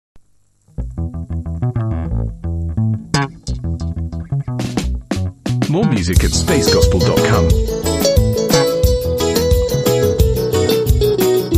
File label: protestant trainees choir